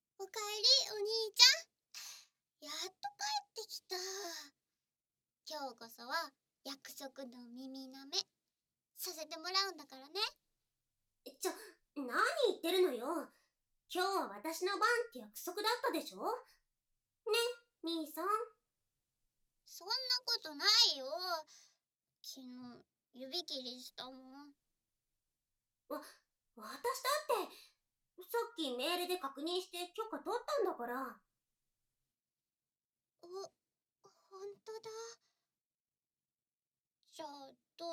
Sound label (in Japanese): 姉妹の取り合い耳舐め